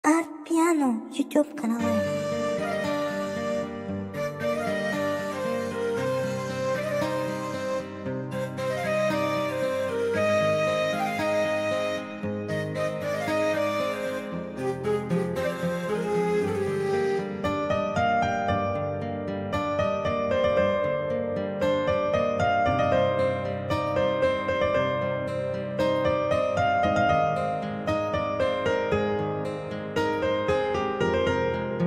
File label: karaoke piano